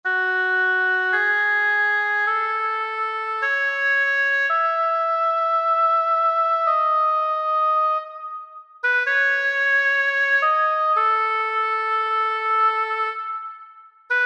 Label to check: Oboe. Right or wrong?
Right